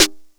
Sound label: Bass Snare, Jomox Airbase99, Drum Machines